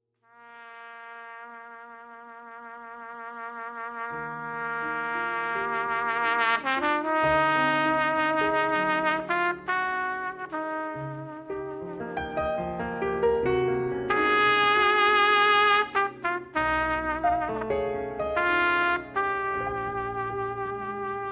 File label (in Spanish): Balada para piano y trompeta